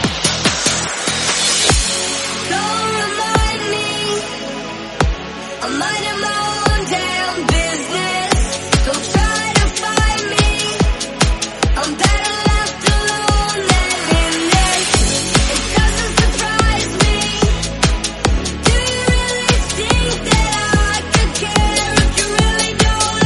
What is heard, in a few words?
145bpm